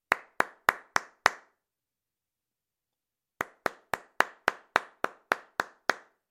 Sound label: after a concert he claps only